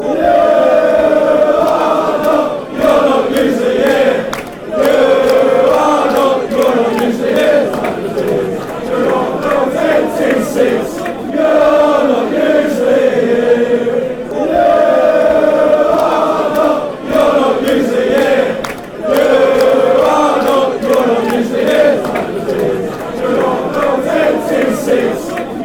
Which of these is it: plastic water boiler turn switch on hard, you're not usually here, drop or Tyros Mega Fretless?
you're not usually here